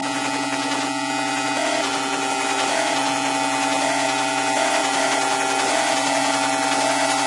pensive

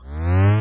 slomo End

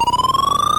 ball hits hole, golf

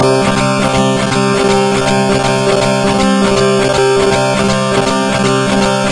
melodic drone

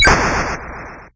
ball gets killed

